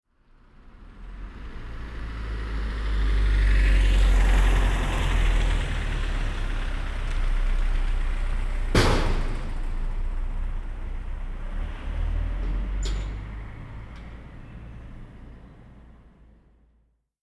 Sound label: Car Bang, Prag field